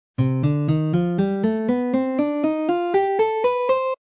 c melodic minor scale pattern, guitar

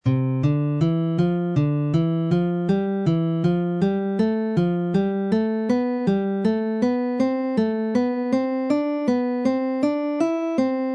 open c major scale sequence of fours, guitar

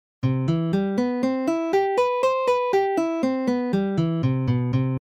c major seventh arpeggio pattern, guitar

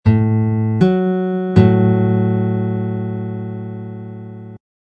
major sixth, guitar interval

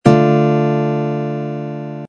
d minor, guitar

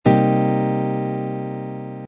c minor seventh chord voicing, guitar

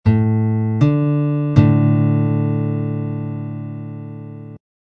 perfect fourth

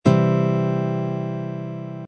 c major open chord, guitar

